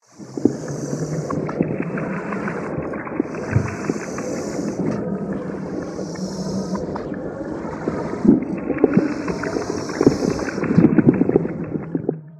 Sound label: Cockleshell Idle; we are the dwarves gamerip 2016